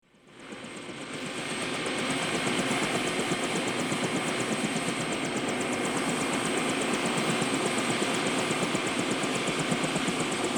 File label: Helicopter, Jet Ranger Idle; Rosegarden